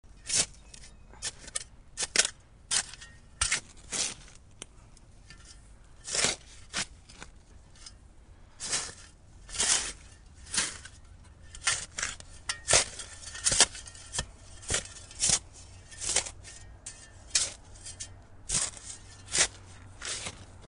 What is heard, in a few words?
Shovel Digging; Force Of Nature; June Irwin